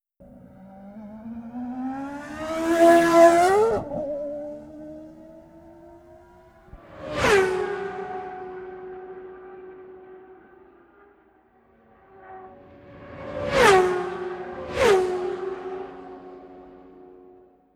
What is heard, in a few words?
Top Fuel Car Fast By Multiple; Sony Pictures Sound Effects Series